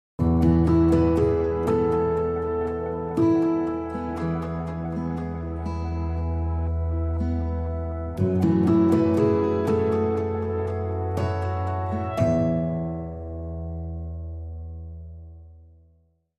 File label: Bumper